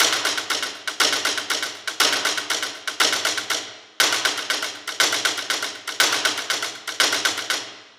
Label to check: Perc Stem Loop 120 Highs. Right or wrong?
Right